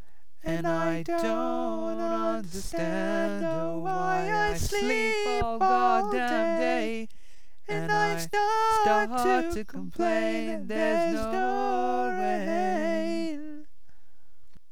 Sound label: norain both sync